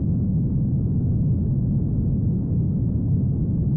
rocket flight

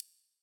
click Generic

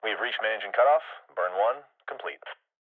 launch sequence

